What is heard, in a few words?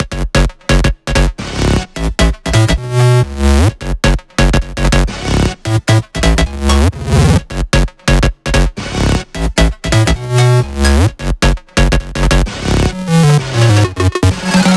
130BPM Melody; VFH1 130BPM Change Kit